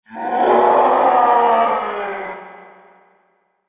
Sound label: menace level hologram skull; Earth and Beyond